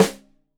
Rim Shot Stereo, drums; Deeply Sampled Drums

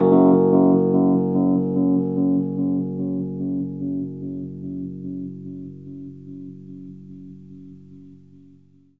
Rhodes octave F#, L; 3D Sound; 3D Keys VOL-1

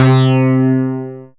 eth, Ethnic Synths

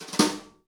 New Brush Snare Phrase; Snares

